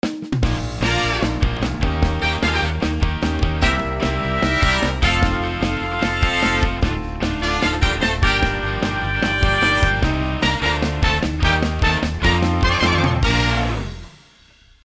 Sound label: Fast Horn Section; MOJO 2